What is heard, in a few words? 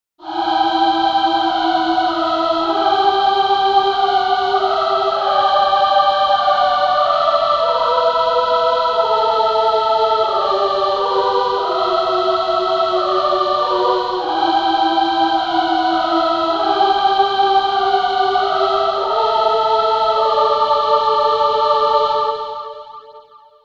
female choirs; propellerhead; reason4